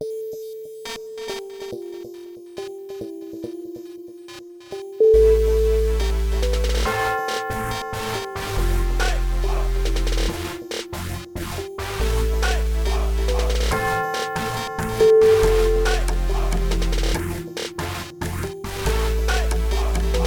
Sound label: urban trap